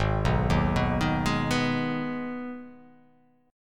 G sharp Minor G sharp down, chord

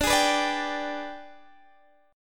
Ab Minor Major 7th Flat 5th chord

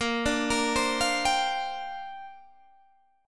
A sharp 6th Add 9th, Guitar DADGAD

